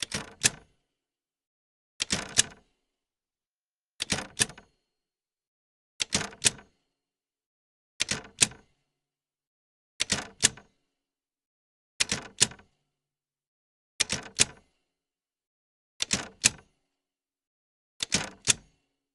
typewriter olivetti backspace key press with carriage movement mono; Sonniss GDC